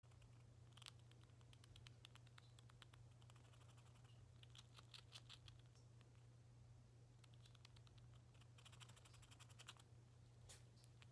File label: happy rat bruxing